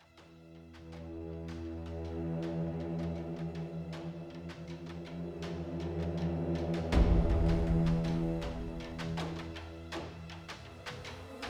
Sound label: Lead Up Action